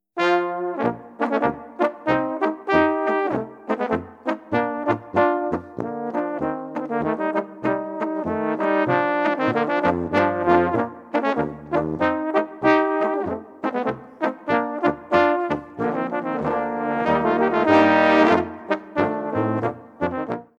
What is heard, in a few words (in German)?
Boarischer